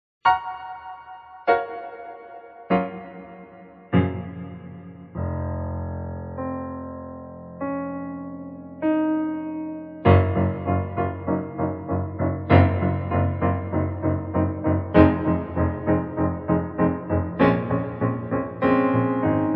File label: Reverb